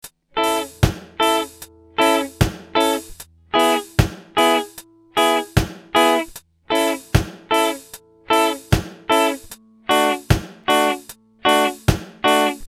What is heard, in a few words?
Reggae rhythm guitar, off beat skank rhythms, muting, 16th notes and dead s; Reggae music from Jamaica